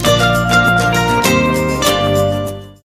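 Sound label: Acoustic; PlanetCoaster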